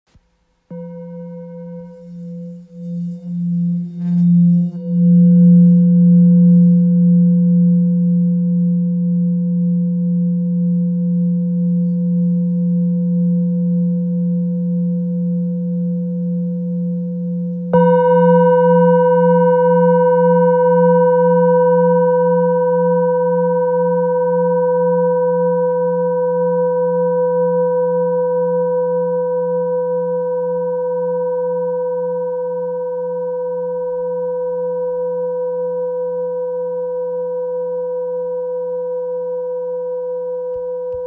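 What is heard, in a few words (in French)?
bol therapeute la, bols chantants tibétains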